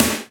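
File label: POWER KITS, snare; 90 sSampleCDs; Crypton - DrumBase5000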